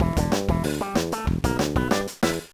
Jingle, gba

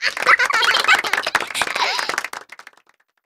Minigame (Win)

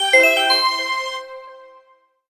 Jingle Goal

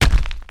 Rock Monster Step; Ruins

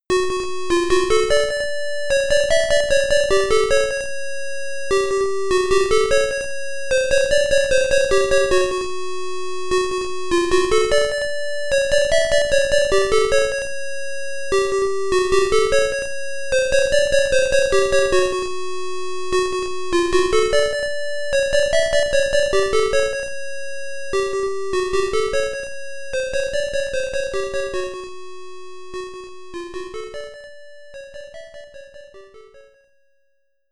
Balloon; Pitfall II; SC-3000

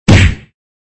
High Impact; Extra Lives

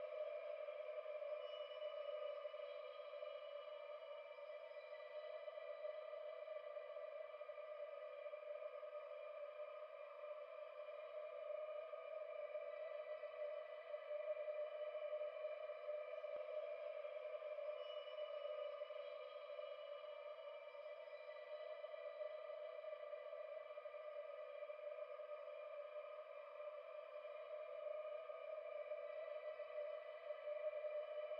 Ambient Perfect Loop; song of iron

